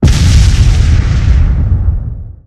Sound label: Sfx Explode; Friday the 13th: Killer Puzzle